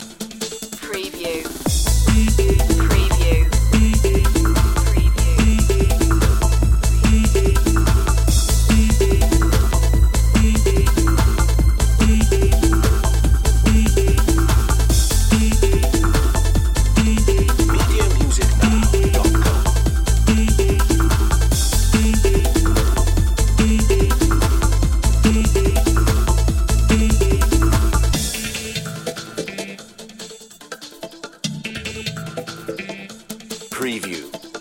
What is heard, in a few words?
electromanics loop